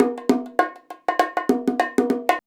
BONG, BONGO 100, Modern Percussion Loops

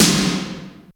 D70 SD, D70 DRUMS; Crypton - DrumBase5000

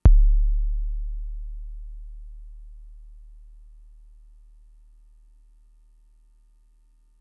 kick, Fricke MFB-522

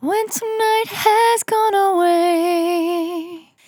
On A Star 65bpm F, Vocal; Zero-G

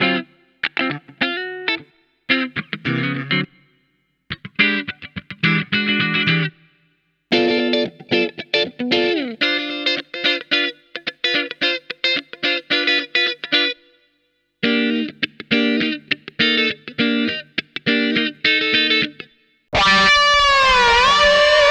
guitar; FutureMusic

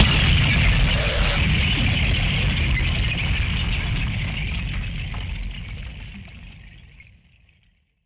Noise Bomb; musicradar; bomb factory

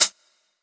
Lo-Fi Ambient Hi-Hat; BLOOPFUTURE

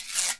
guiro, Synclavier Percussion, World & Orchestral; ILIO; Synclavier Percussion 2

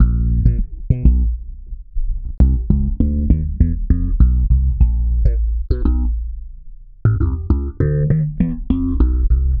Processed Slap Bass, Electric Bass

